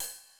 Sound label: ride, Roland MC-909